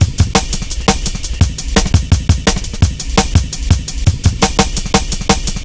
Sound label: 170, drum loops, drum & bass